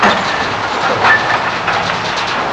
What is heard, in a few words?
Ore Drop